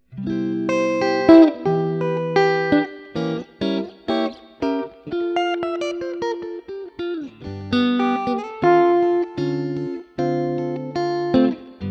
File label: jazzy guitar